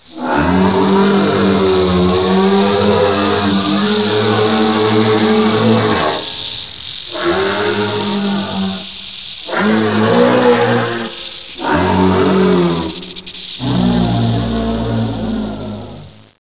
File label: death; geneworm